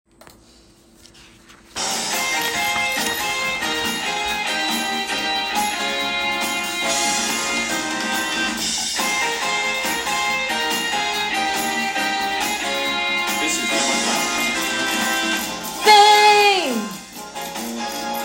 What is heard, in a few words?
Alto; Fame The Musical - Holiday Pack